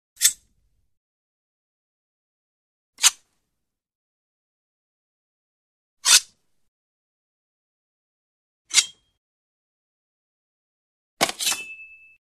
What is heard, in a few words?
Knife Draws Metal Slide Scrape, Metal Scrape; Hollywood Edge Foley Sound Library